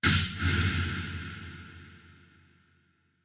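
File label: drumsplosion echo kick; single hits; electronic hits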